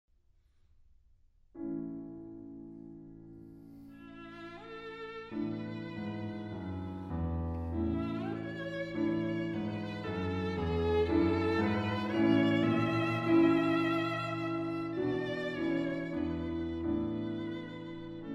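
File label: violin sonata; franck r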